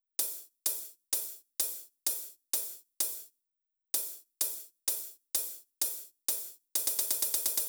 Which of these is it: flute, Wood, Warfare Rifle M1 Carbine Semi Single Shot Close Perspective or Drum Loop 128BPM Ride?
Drum Loop 128BPM Ride